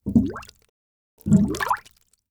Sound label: Liquid Water Water Bubbling In Plastic Tub